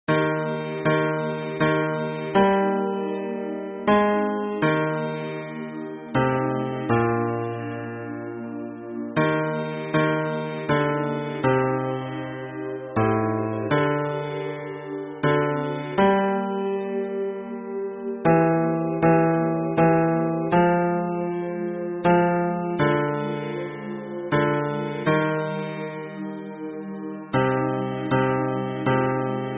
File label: hymn bass